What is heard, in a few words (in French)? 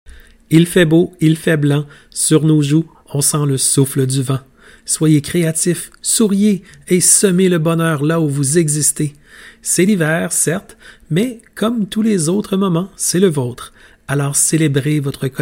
jingle le souffle du vent; Radio-Réveil